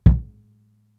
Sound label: Kicks; Touch Loops; CosmicFunk